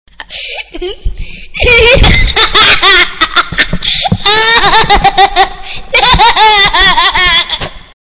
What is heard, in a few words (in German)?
Lachen